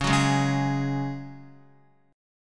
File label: Db Suspended 4th Db, Guitar; strummed